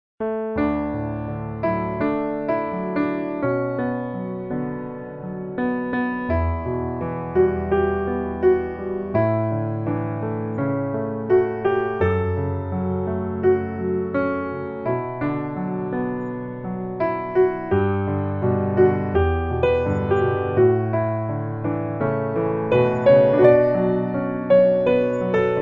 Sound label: piano